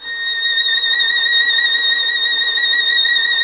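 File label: string violin, Solo Instruments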